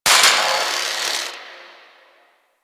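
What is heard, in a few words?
Distant Electricity; Ambience; Warframe; Corpus Gas City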